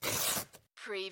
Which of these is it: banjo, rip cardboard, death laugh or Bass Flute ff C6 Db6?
rip cardboard